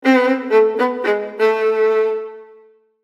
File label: violin